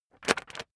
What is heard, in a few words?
magazine skim bright